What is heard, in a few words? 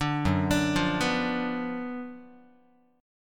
F sharp Major 7th Suspended 4th Sharp 5th, F sharp, down, Guitar; F#M7sus4#5 arpeggiated